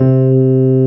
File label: SUITCASE, Keyboards Old School; USB Soundscan vol.09; AKAI